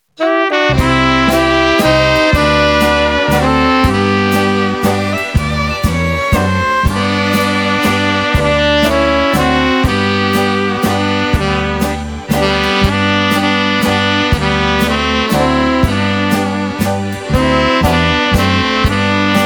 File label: Waltz